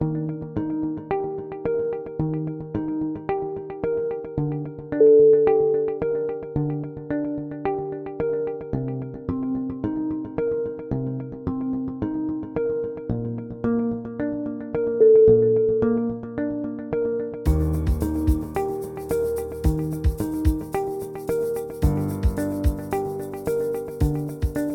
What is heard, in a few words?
neutral background